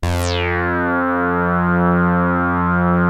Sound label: SAW RES WET, SYNTH; Keith Emerson Signature Series